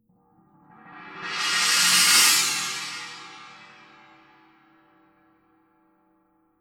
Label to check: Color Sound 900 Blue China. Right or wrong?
Right